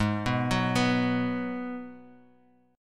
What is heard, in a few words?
Ab Minor 7th